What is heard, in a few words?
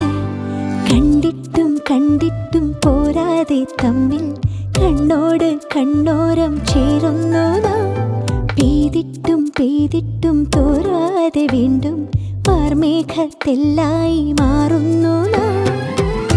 female ringtone